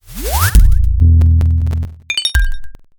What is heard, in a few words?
logo sonic; margital